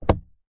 vehicles car washer stick flick internal nissan patrol; zapsplat